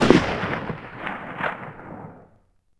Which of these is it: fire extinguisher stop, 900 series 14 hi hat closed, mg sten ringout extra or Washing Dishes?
mg sten ringout extra